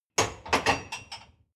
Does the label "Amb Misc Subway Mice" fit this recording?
No